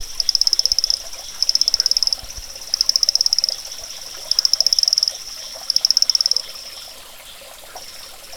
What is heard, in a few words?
Liuixalus romeri